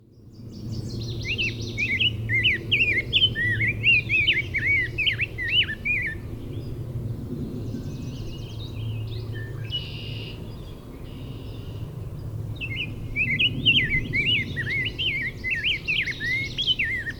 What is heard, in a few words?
Rose-breasted grosbeak; Fowl Meadow